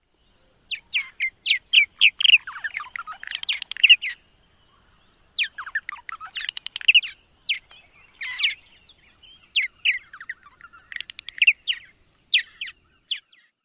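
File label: bubbly songs, Purple Martin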